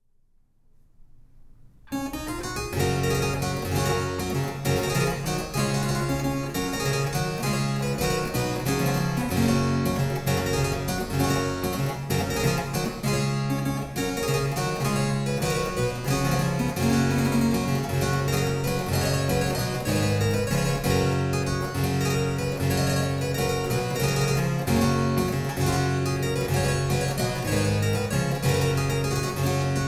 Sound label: la volta, harpsichords